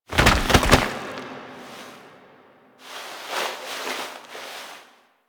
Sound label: React Wall Pulse Operator Foley Roll; Warframe; The War Within